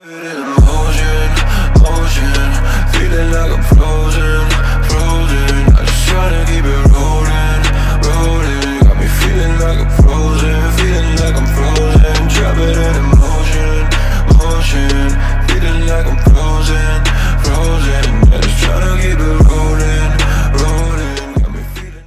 scratch frozen ringtone

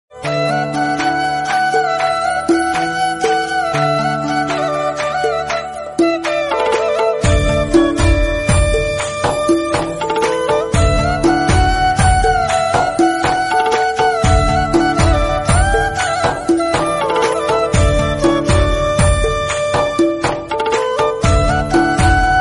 flute ringtone; android; radhe krishna

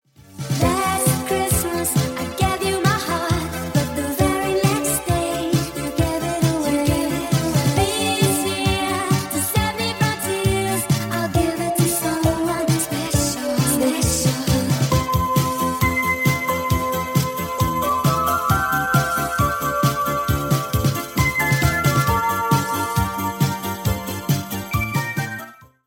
christmas song ringtone

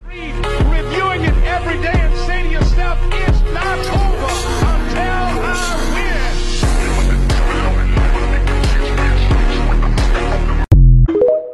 morning ringtone

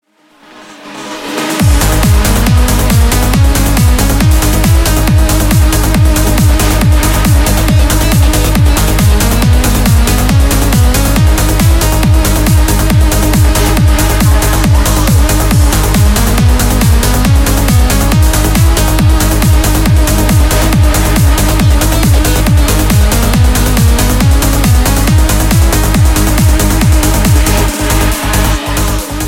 progressive ringtone

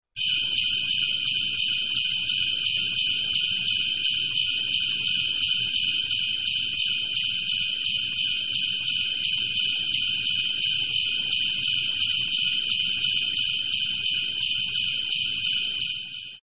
santas sleigh bells ringtone

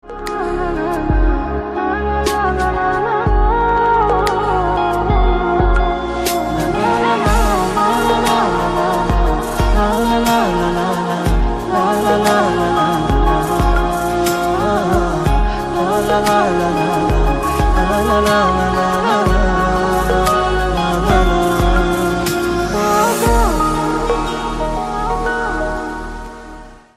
classic ringtone

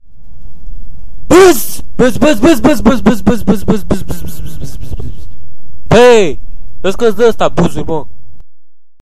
buzzz ringtone; text message; android

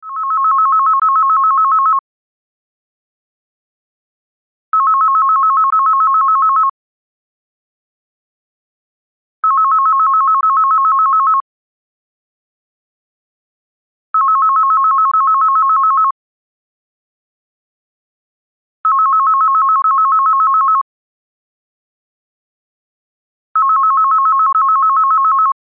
landline phone ring ringtone

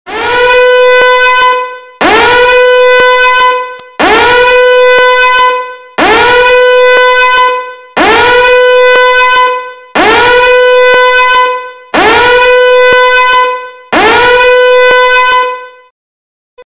fire alarm ringtone; android

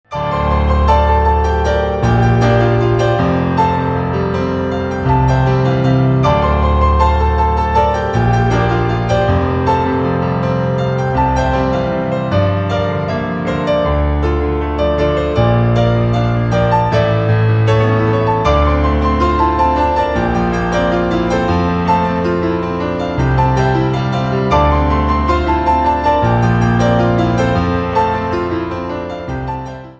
piano ringtone

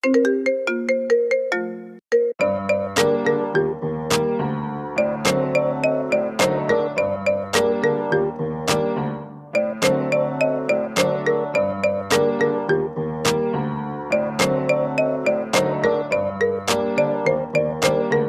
marimba remix ringtone

